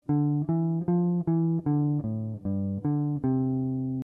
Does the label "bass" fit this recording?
Yes